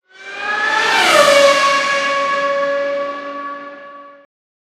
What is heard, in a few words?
passby